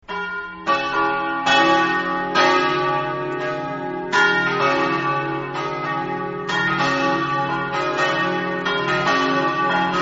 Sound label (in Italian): campane